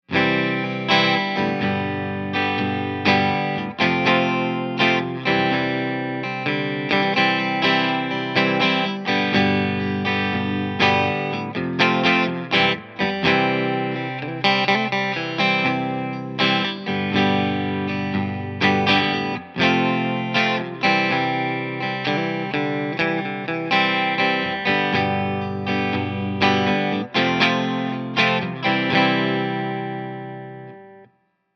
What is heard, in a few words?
strat clean rhythm; victoria-518